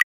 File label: click sound effect